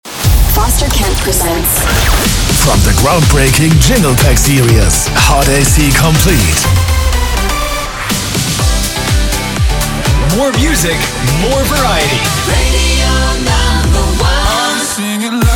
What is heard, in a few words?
Hot AC Complete; jinglepacks